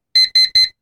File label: alarm